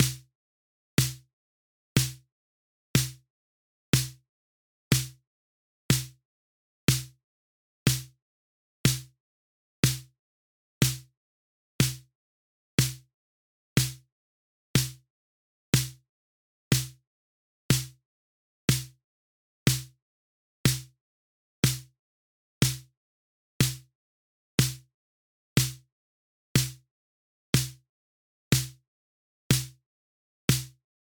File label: 122 BPM Snare; mmb studio melodic